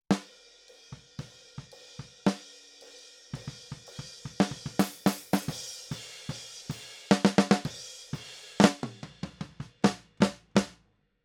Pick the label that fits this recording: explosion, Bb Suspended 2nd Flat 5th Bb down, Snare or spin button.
Snare